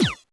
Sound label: Creatinator fire; LittleBigPlanet 2; PS3